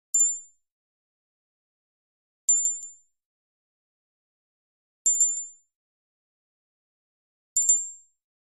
Tinkle Bell 4 Small Be, bells

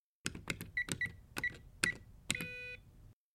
Alarm Keypad Entry; hi-tech-various

